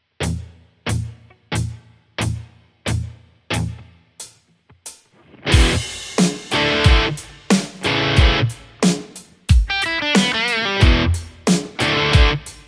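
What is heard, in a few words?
Stratocaster; overloud th3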